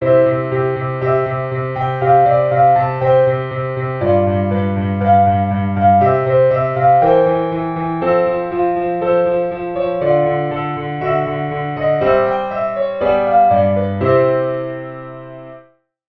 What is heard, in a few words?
Sentence Complete